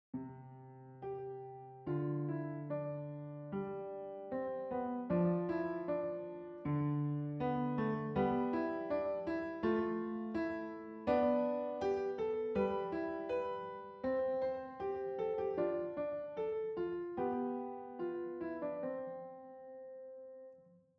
Piano

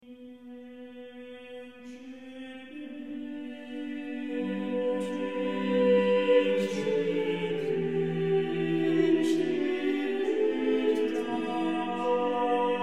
Lamentationes; Orlandus Lassus; Hieremiae Prophetae; Jeudi Saint; Lamentatio Prima

